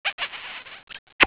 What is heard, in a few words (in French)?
bisou